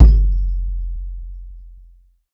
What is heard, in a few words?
low tom drum f sharp key; tom drum samples; one shots